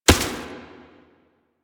Step Dirt; Enemies; Foley; Footsteps; Warframe; Duviri; Dax

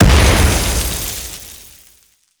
Grenade Explosion; Quests; Veilbreaker; Kahl Abilities

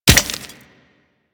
Grenade Hit Dirt; Warframe; Grineer Ghouls; Ghoul Expired; Attacks

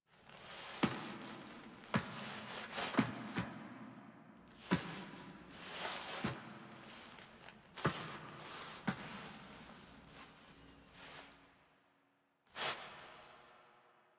Foley Face Mirror; The War Within; Operator

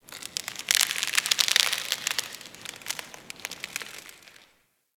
Ice Creak Echo

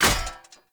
Polearm Medium Impact Metal; Weapon Impacts; Player; Environment; Sphere Polearm

